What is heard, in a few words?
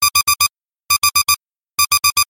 digital; ClassroomTimer